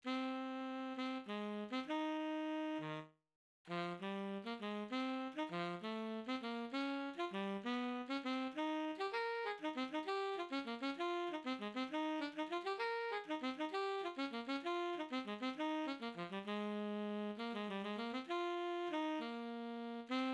tenor sax etude

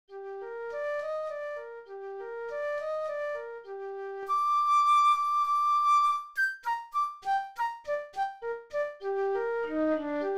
flute etude, 100

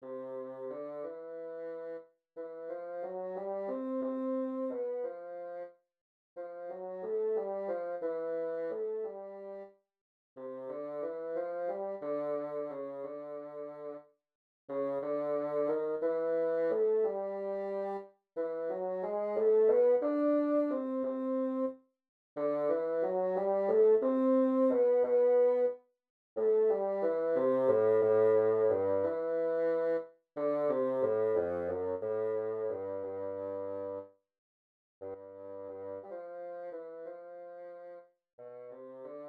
bassoon etude, 60

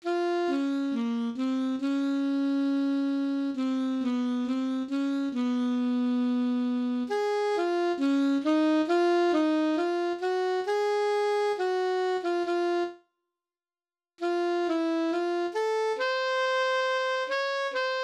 sax etude, alto sax

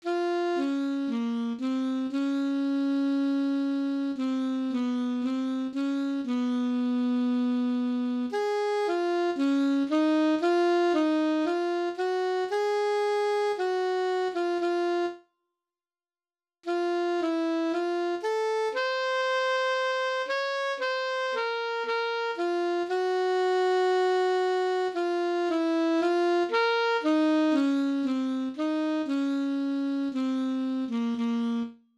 alto etude

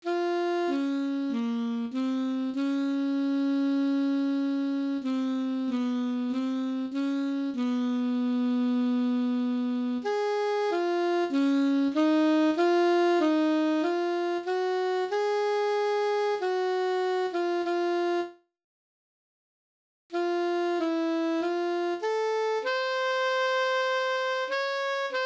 alto etude

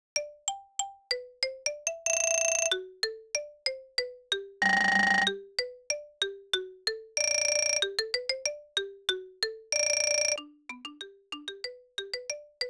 mallet etude, 94

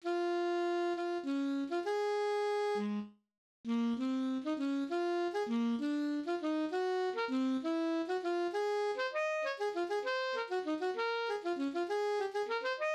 alto sax etude

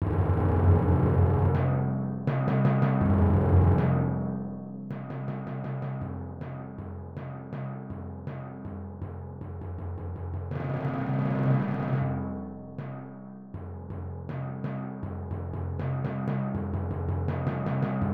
timpani etude, 80

